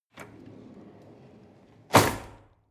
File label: drawer cutlery open draw hard